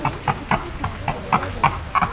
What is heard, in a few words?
Papio papio female